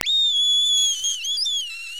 BIRD, bird call; Greytsounds VOL-1; BIRD CALL FX

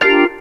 tuners

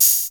HH hh; Best Service Gigapack 2

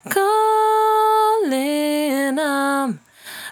68bpm D, Velvet Vocal; Zero-G

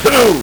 LSDj, Nintendo Gameboy; 300 Drum Machines

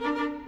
Violas, Orchestral

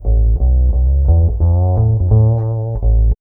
BASS, Funky Ass Loops

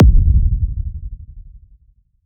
techno kick

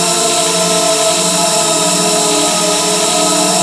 F3 VOX; Northstar - The Wizard VOL-1; VOXTAGON

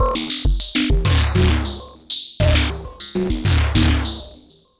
Ratchet Beat; Sonic Foundry (Sony Creative Software); Ma Ja Le Ethereal Textures; Beats

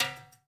TIMBA, BR TIMBALES; Spectrasonics; Liquid Grooves 1 & 2

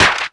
SD, 160 BPM, Drum & Bass; Ueberschall; Drum & Bass Flash Trackz

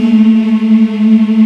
African Mm p; Roland LCDP11 Africa VOL-1

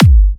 G, kicks; markus hakala kicks

